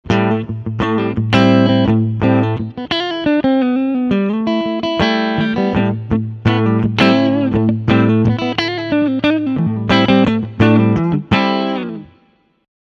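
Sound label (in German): Melodie Humbucker Front Clean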